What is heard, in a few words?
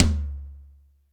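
dry tom, dry kits; Crypton; DrumBase5000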